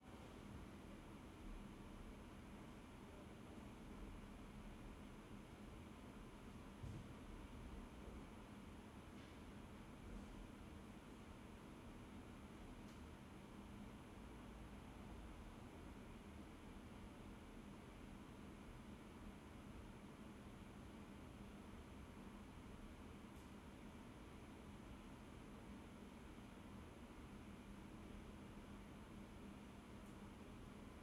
Ambience, Roomtone, Computer Classroom, 16 iMacs, Next Door Light Activity, Hum; Hum with Hi-Freq Buzz; Sonik Sound Library; Spatial Roomtones & Interiors